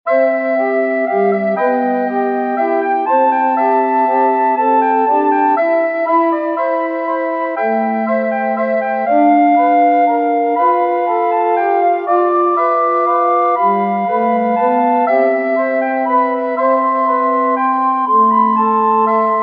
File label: Recorder Quartet; Queen of the Sea; Bill Gassman